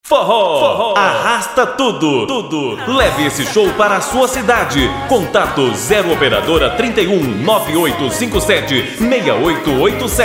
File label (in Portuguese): forro arrasta tudo